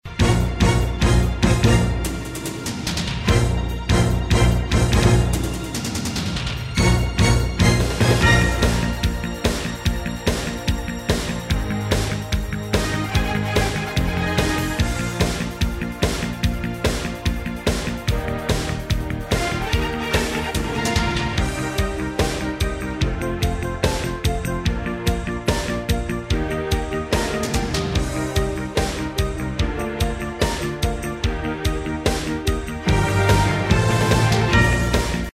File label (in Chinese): 节奏感强 劲爆节奏